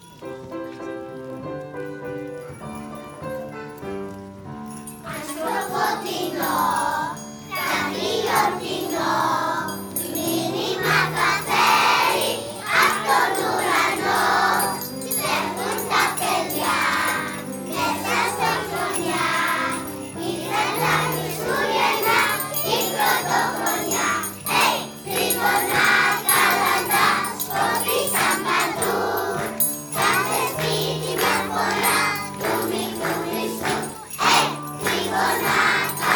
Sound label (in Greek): Τριγωνα Καλαντα τραγουδι; Προδημοτικη